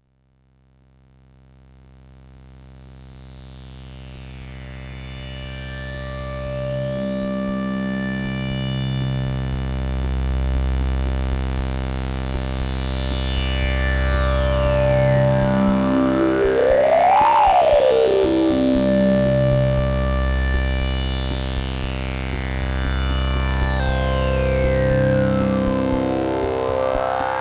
MicroBrute Slow Pad

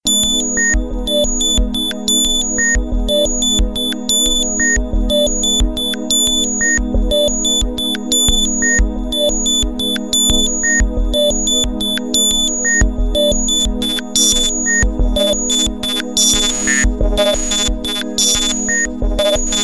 ms20 filter reso

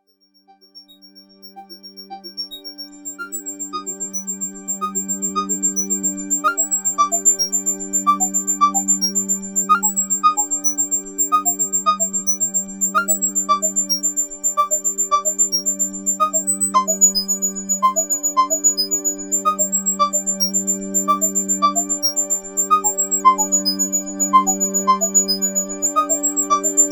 ms20 mix

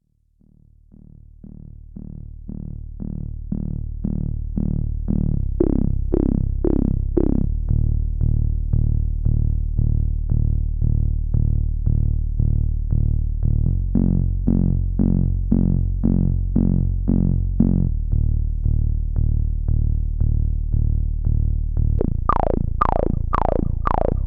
1 VCO 12dB LPF reso; GRP A4

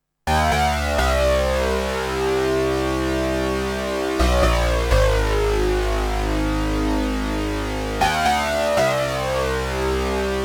synthex laser harp

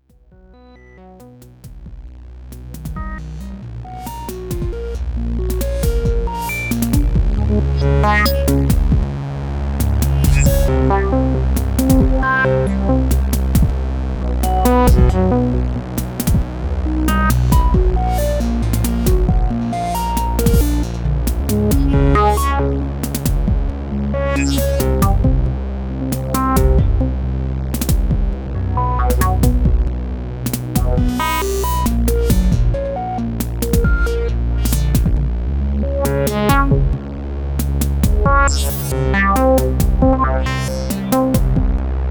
Factory Wave Sequ; Pro3

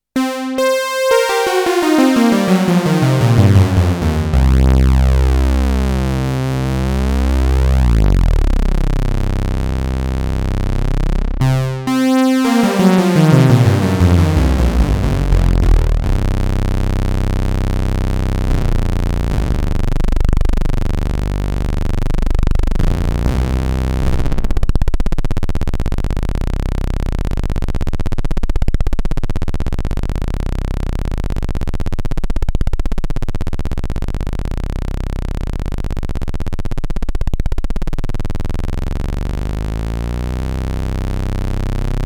Roland Jupiter6 32 Feet To Sub Audio

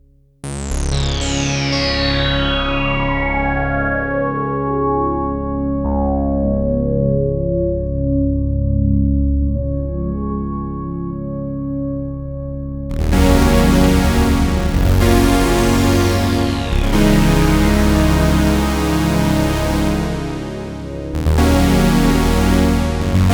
JupiterX JX8P Simple Brass

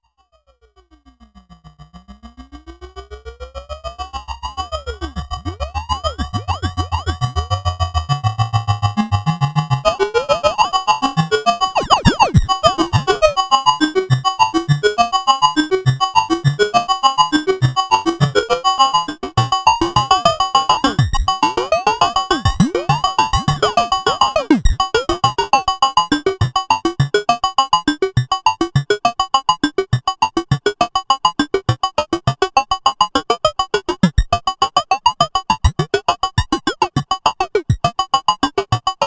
ms20 fx

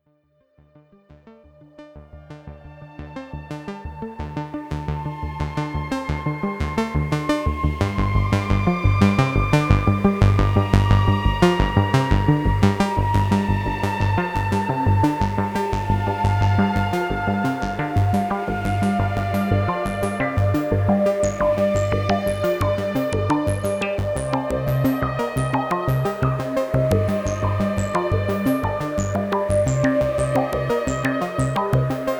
jupiter4 synthex

